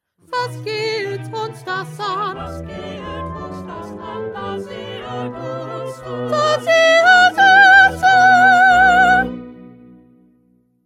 2nd Soprano

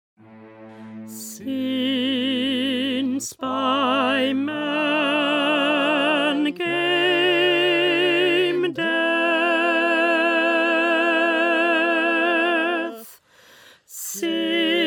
Alto; Handel; Messiah

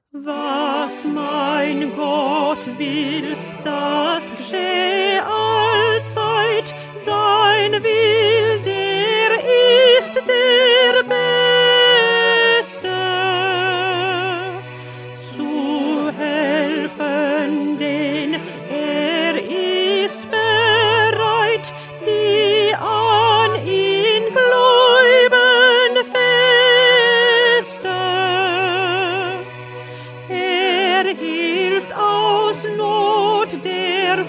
Alto; Bach; St Matthew Passion

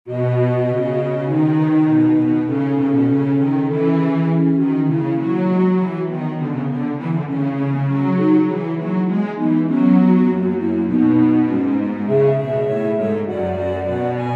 Instrumental; Bach; St John Passion

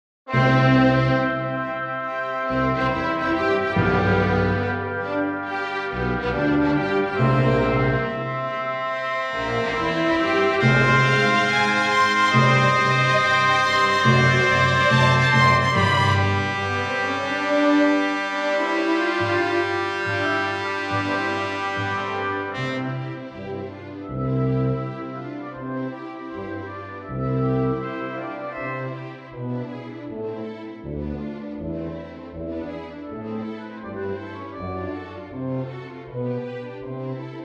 Instrumental; Mozart; Coronation Mass

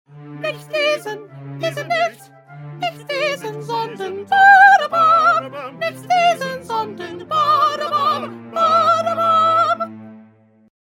Soprano; Bach; St John Passion

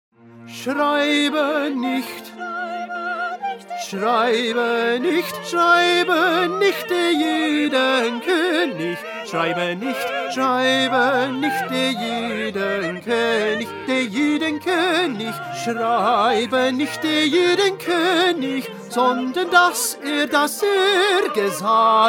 Tenor; Bach; St John Passion

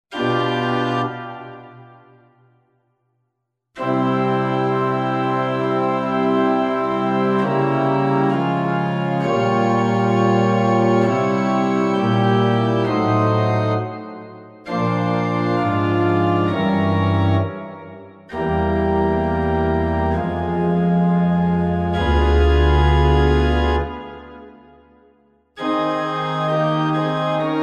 Instrumental

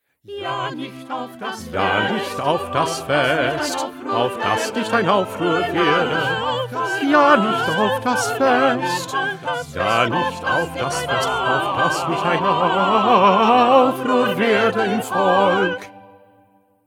2nd Bass

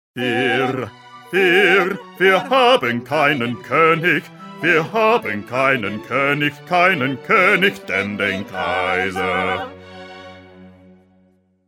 Bass; Bach; St John Passion

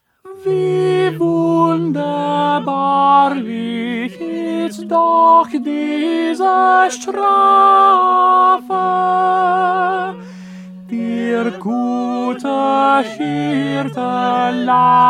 Tenor; Bach; St Matthew Passion

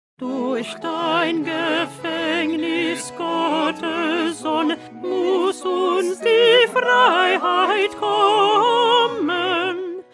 Alto

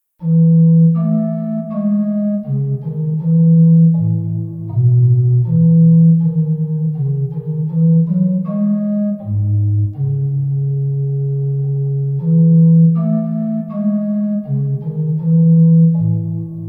Instrumental

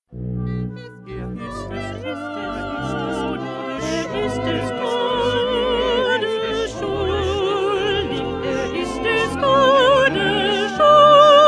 2nd Alto

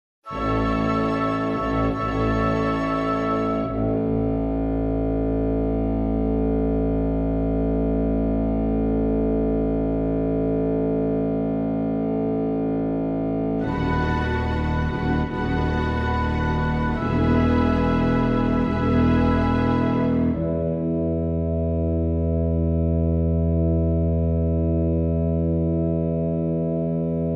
Magnificat Instrumental; Bach

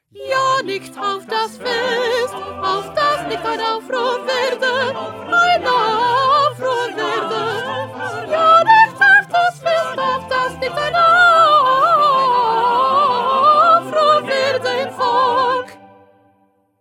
1st Soprano

